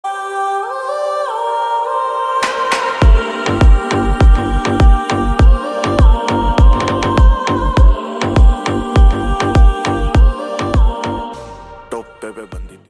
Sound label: BGM Instrumental